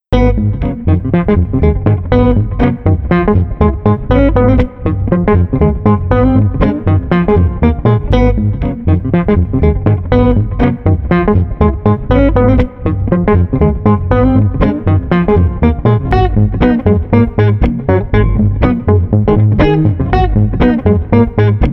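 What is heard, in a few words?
Street Guitar Bass Funky, 120 bpm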